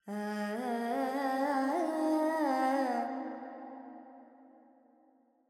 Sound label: Pentatonic, Vocals Carnatic Female Alaps 70 G